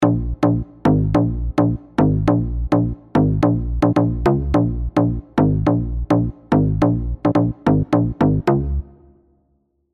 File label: BASSES DEEP BOUNCE